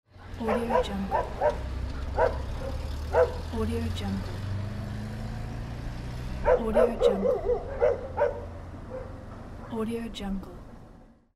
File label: dogs barking per moving car